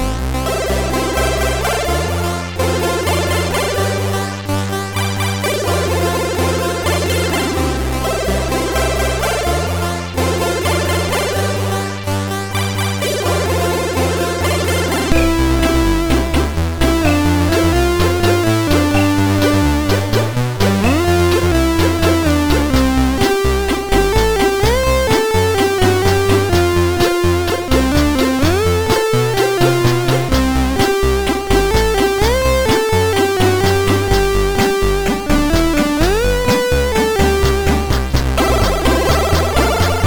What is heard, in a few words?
Music Samples, sid, MOS6581R2